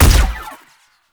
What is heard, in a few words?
Shot Fire; Warframe; Weapons; Acceltra Prime